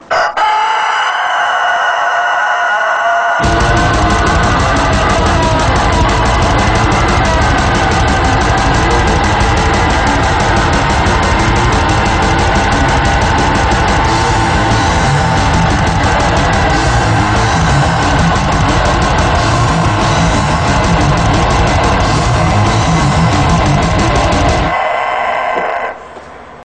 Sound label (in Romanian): Alarma Rock